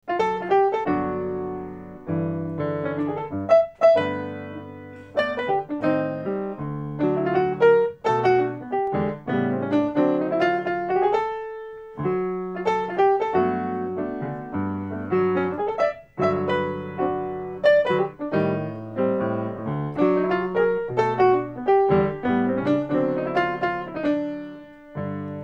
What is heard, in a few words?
playing